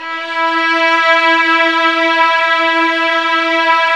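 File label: Violin Ensemble; Piano Layer, Grnd Str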